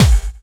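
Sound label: snare drum bass drum, Dance Mega Drums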